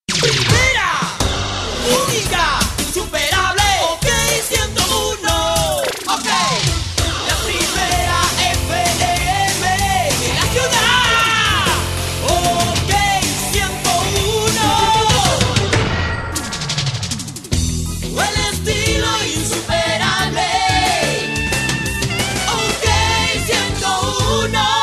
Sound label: jingles pop rock